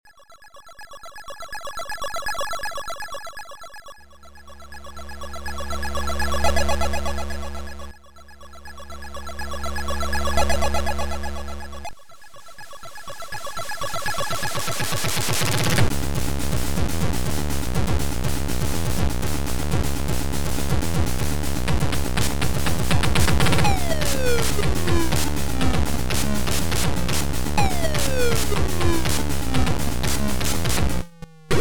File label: BUZZ